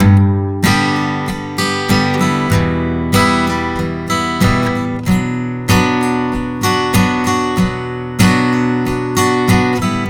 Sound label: 095 Pop Ac-Guitar Strum Gmaj; Pop Rock; Acoustic Guitar; Pro Tools Essential Loops; Digidesign